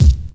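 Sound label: bass drum hip hop; DRMKIT; E-Lab; X Static Goldmine 2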